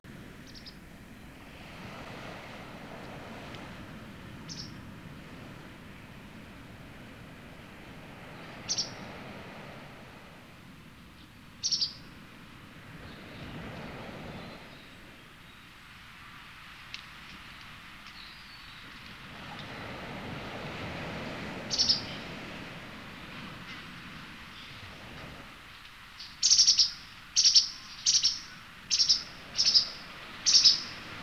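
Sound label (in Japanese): ハクセキレイ, 野鳥の鳴き声; 筑紫小野鳥観察ｸﾗﾌﾞ支援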